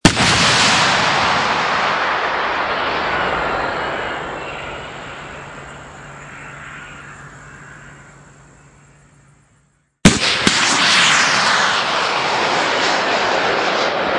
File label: launching anti tank missiles